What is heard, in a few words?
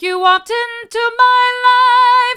hard, vocal